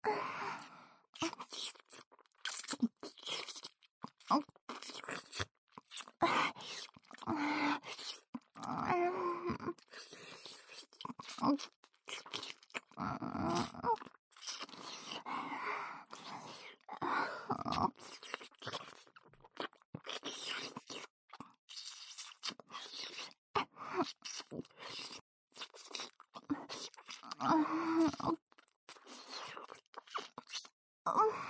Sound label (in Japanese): キス ループ; エミィ; 爆乳Wお姫様; 異世界救ってお姫様とご褒美孕ませエッチ♪